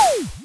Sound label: slash; heros